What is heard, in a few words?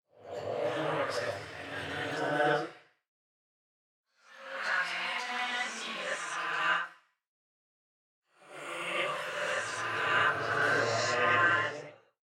Unreal Whispers